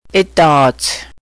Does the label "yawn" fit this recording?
Yes